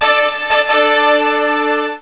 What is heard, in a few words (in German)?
FANF